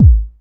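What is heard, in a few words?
custom house kick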